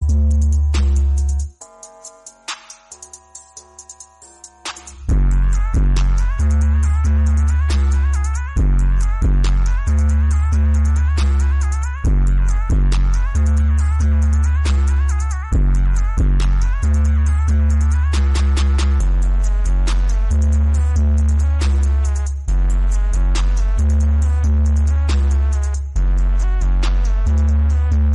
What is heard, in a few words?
INSTRUMENTAL RINGTONE